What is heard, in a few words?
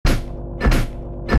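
Sound Monster Walk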